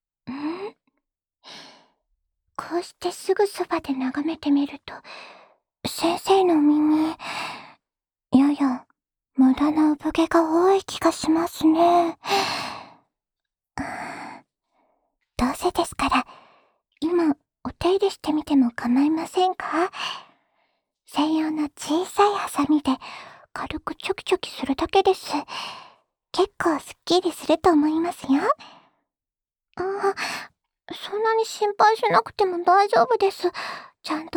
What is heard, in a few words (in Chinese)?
安眠用 剪耳毛（右耳）